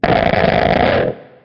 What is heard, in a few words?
short sharp blargh, Guitar Noizes